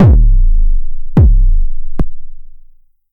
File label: clean hitting kick analog; analogue